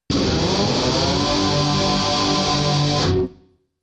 Solo Guitar Riff

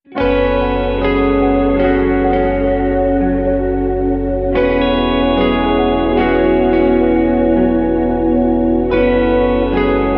No Drums Percussion with Melody

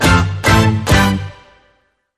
Hit

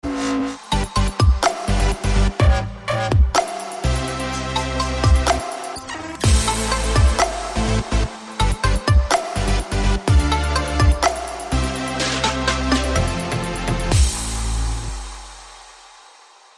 Pop Trap

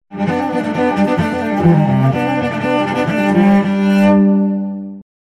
Hillbilly Haywire Cello

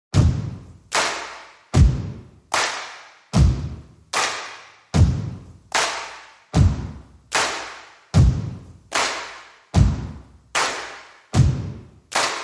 Drums and Bass

